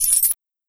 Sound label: coin; word link2